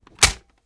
mg3 close; tacz; daffas arsenal

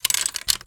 mg3 belt; tacz; daffas arsenal

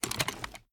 mg3 put away; tacz; daffas arsenal

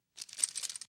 spin, hk45; tacz; daffas arsenal

